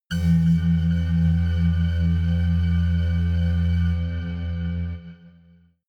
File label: synth hum echo, drones